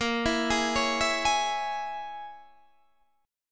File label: Bb Minor 7th Flat 5th, Guitar, DADGAD; 20,19,18,18,19,18